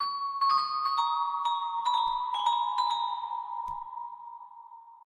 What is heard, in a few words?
Music Box Melody; Freddy